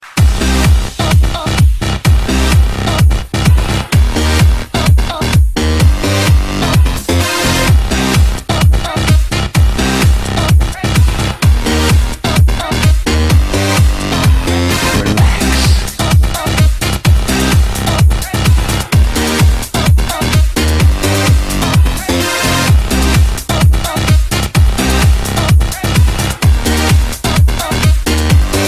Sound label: Sax Version